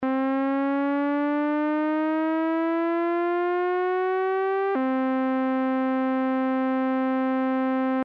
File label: ADSR Mod Env; synthese sonore les enveloppes